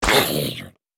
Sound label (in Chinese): 僵尸村民受伤声